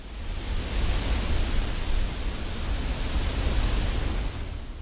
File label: wind full leaf; EnvSound